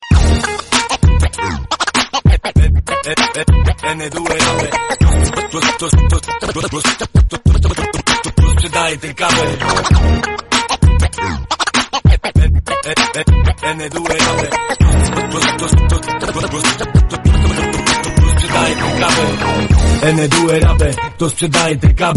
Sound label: polish hiphop